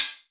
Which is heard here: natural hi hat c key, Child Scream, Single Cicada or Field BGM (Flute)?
natural hi hat c key